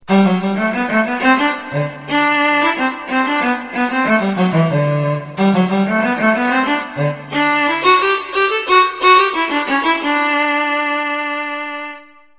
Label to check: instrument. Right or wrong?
Right